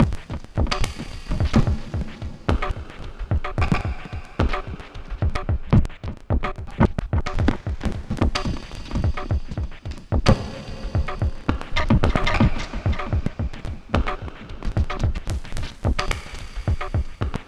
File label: Glitched, Processed Percussion